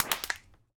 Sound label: engine clap fx